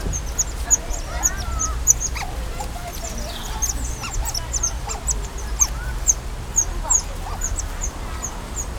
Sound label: Aonyx cinerea squeak; Some otter calls are so high-pitched, that resemble bird chirping; oriental small-clawed otters